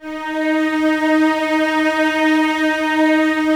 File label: D#4, Cellos, String Ensembles; Miroslav Vitous